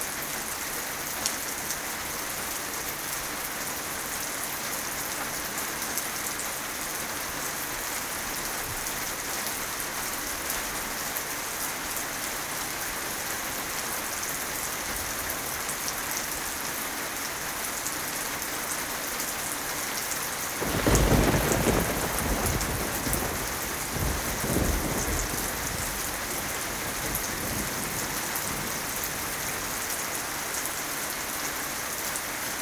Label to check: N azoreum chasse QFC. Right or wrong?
Wrong